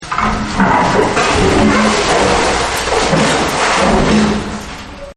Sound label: Chaos Monster; Crunches; Monster Sounds; Objects